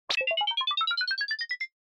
Motion Slide Slow

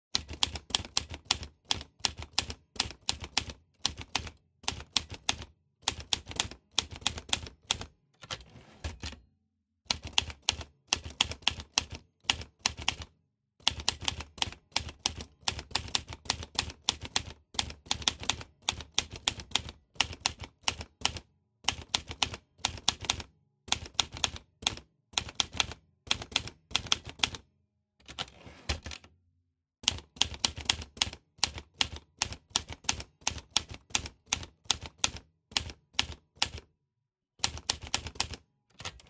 Typewriter Type

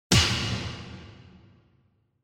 Hit Punch, Reverb; 打撃

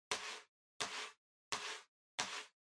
PC Mouse Move Far Hard Pad Hit

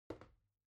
Air Circulator Far Put Down

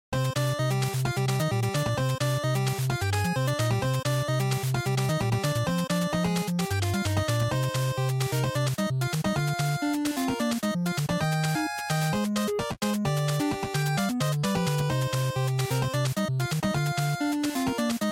NES Action Stage

